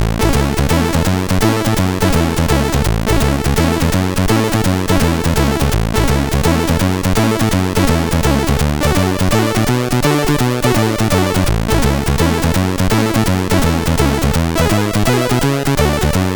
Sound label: Rock n Roll, CSG8580R5; hvsc